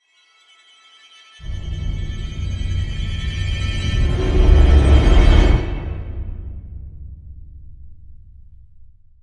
Suspense Tragic

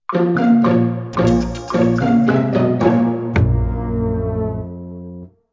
fail

